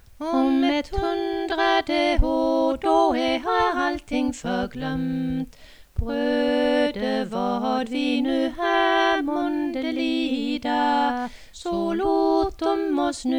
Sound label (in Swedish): Om ett hundrade ar stamsang